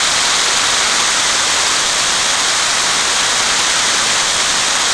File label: sprinklers, water; Tony Hawk's Project 8; XBOX